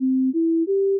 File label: chord